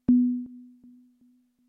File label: dropped